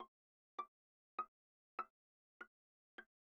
Viola pizz sul A pp C6 G6

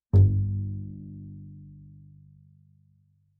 Bass pizz ff sul E G1, Double Bass; MIS Pitches - 2014; Strings

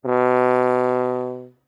Tuba ff B2; Brass

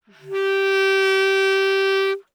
Bass Clarinet ff G4 stereo; Woodwinds

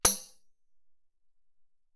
tambourine sforzando; Percussion

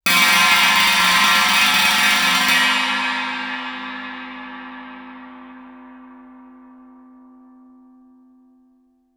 chinese stick roll, Suspended Cymbals; Percussion

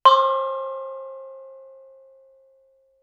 thai gong ff C5; MIS Pitches - 2014; Percussion

